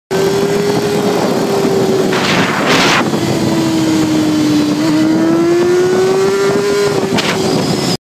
motorcycle; موتور مگلی

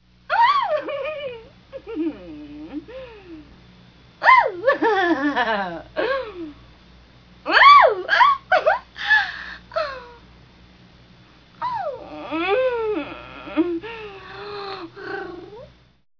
Laughing daughter

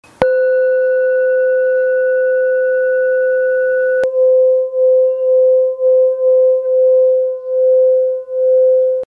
Vibrational

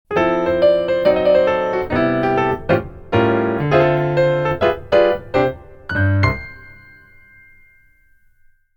ragtime logo standard

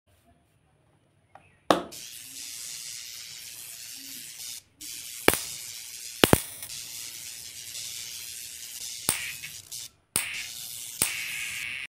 electrical connection, اتصالی برق

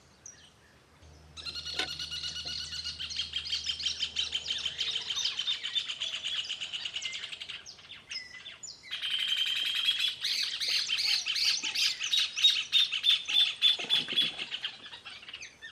rufous hornero

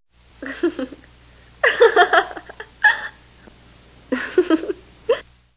Laughing Girl

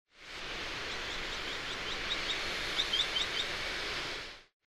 Madagascar pratincole; پرنده گلاریول ماداگاسکار

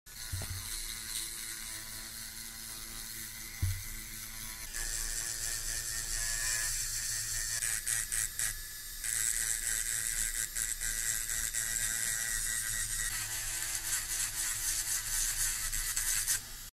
Electric nail file; سوهان برقی ناخن

